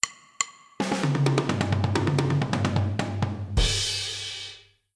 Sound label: victory drums; BTDB2